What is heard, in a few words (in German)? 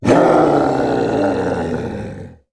Monster